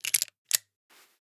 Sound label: python insert shells; Rust